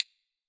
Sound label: Minimalist